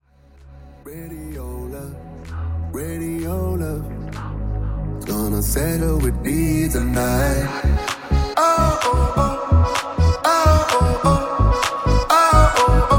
Future House Intro

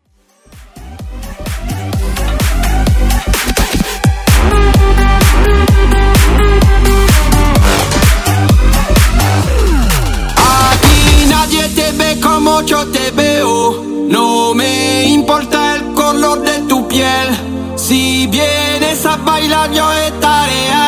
Speaker Test Bootleg

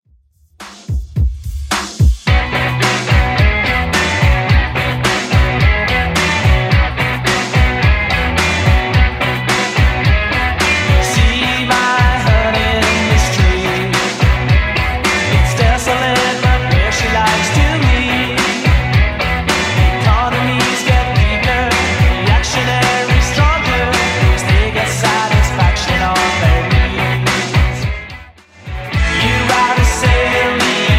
80s Redrum 108bpm

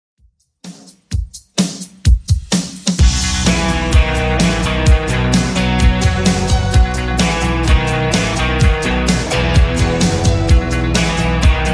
80s Xtend 128bpm

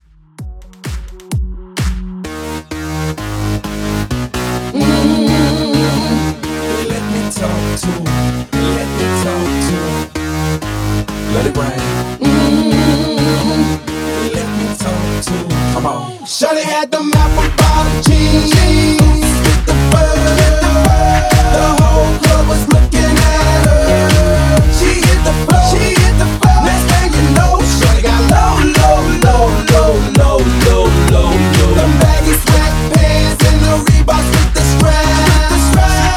Mashup Acapella Out 129 BPM

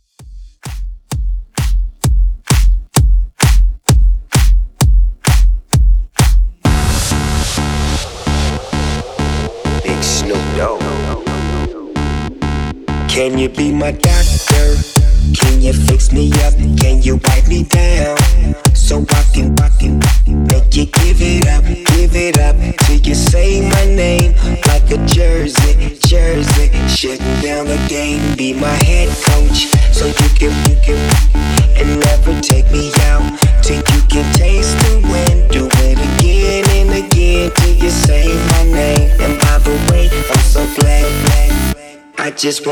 130 Bpm; Redrum

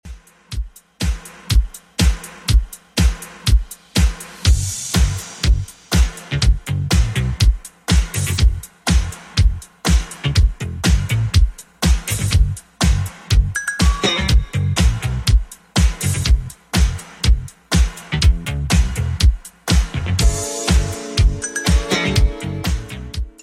80s Redrum 122bpm

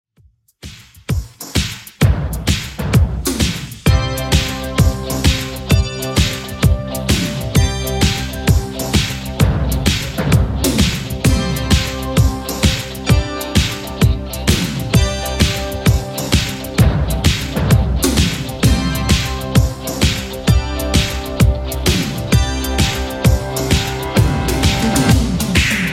80s Redrum 130bpm

